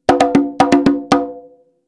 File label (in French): frise, congas